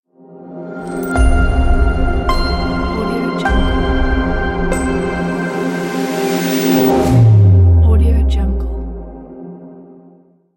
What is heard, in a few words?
space logo reveal